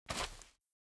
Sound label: walk, turtle; mob; minecraft